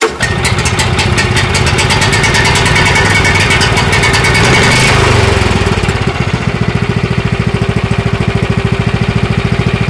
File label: generator start run loop; ambient; Left 4 Dead 2; Source Engine